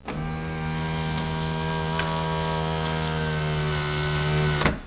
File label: sunroof, cars; transpo